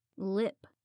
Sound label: lip